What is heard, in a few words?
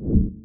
door close; ASCIIpOrtal